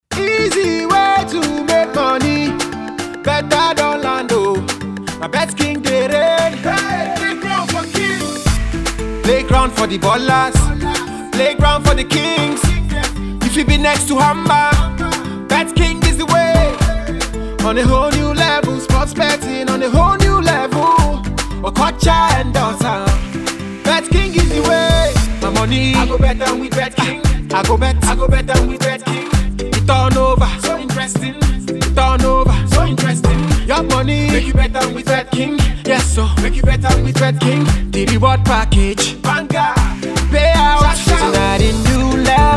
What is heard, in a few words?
full jingle; betking